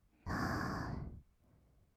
吐く音, ブレス; 1200 kawaii voice!!; ブレス 53種

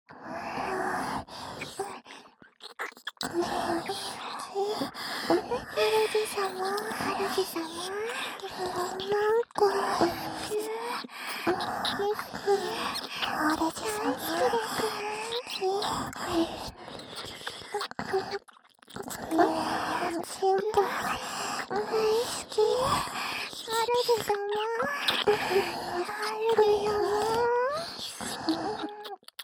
両耳舐め3WAYループ

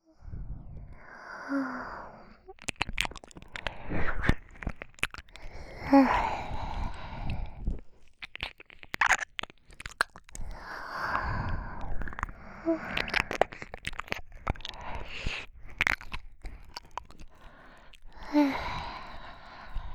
お耳ふぅふぅ&舐め舐め; ASMR

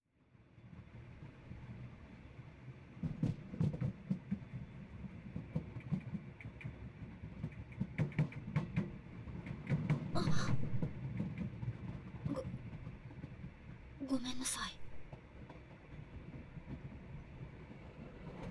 フォーリー; 零日目-遭遇

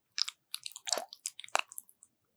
くちゅ音

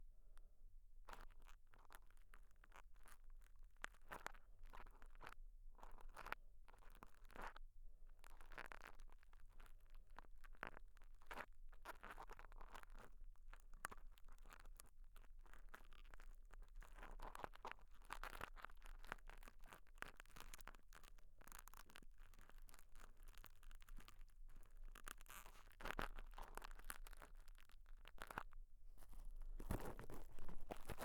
スポンジ耳かき, 右耳

